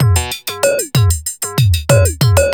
loop 95; AMG - Vince Clarke Lucky Bastard

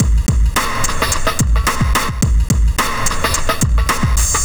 Dive, Drum Loops, Compressed; Zero-G Smoke

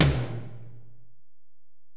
Tom, Alesis DM-PRO

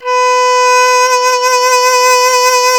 BANDI B4, BAN-DI FLUTE; Spectrasonics; Heart of Asia

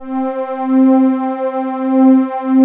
JD800, PAD; Alpine Volksmusik

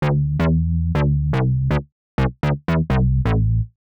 Synth Bass A, 125; PowerFX - Nu Jazz House

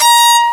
FAIRLIGHT CMI IIX, STRINGS

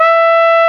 FLUGEL E5, WIND; AKAI S-Series CD-ROM Sound Library VOL-2

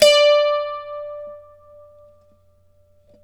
d4, Mandolin; Guitar; Terkelsen

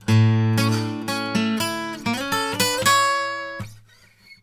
Blues Guitar Samples; ILIO; Fretworks; LICK

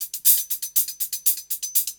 120 HIHAT

